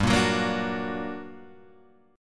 G Minor Major 7th, down, Guitar, Open G

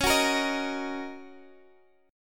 Eb Minor Db; Ebm/Db strummed; JGuitar

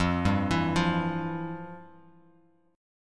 F Major 7th Suspended 2nd, Bass Standard; arpeggiated; JGuitar

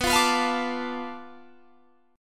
B Minor 7th Flat 5th, Guitar, Bm7b5 chord; Bm7b5 strummed; 19,20,21,22,22,22; JGuitar

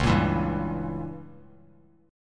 C sharp Diminished 7th C sharp down Bass Standard; C#dim7 strummed; JGuitar

